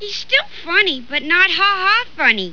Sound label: haha